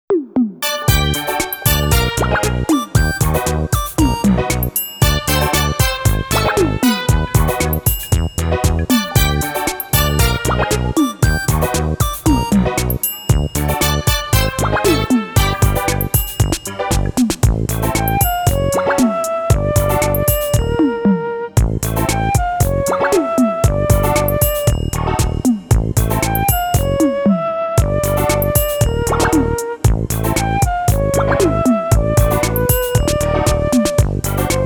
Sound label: Pop Jamming; Yanezutai no Kimi e